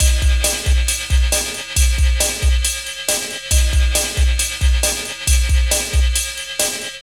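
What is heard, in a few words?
drum loops, left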